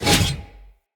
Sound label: Cleaver Whoosh, Swords; Weapons; Player; Bromius Cleaver